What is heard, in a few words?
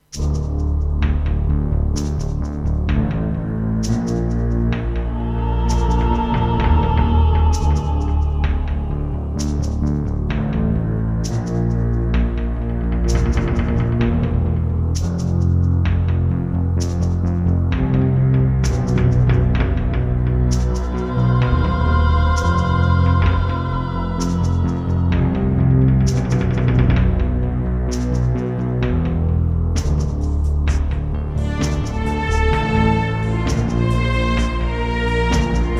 EMU Sound Engine; Descent II